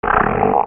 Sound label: ANIMALS